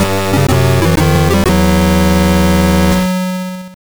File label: Unused Jingle